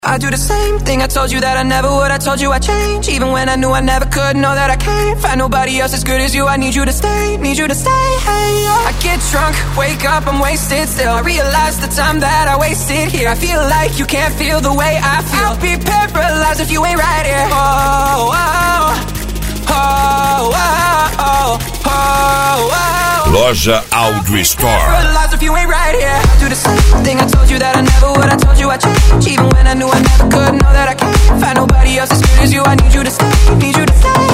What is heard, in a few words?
Electronic Music